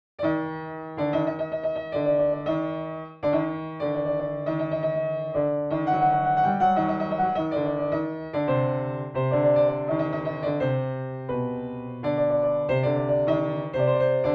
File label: Chahargah, piano; Masnavi-e Mokhalef; Radif Mahjoubi